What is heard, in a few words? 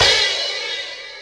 Sample, Roland MV8800, BREAKWILDKIT